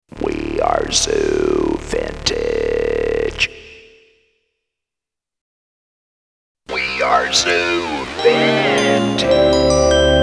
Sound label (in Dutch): Belgische jingles; Ken Justiss; Belgische jingles uit 2017